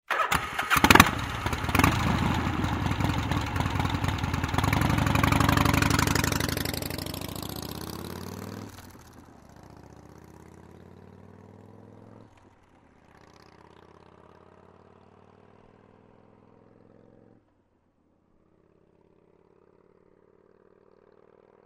Harley-Davidson motorcycle starts, waits, drives away